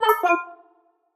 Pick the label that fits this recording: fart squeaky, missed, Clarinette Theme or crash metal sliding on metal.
missed